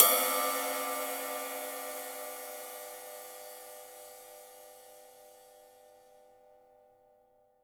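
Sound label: cymbal, Real Drum Kit, swing kit; Best Service ProSamples vol.43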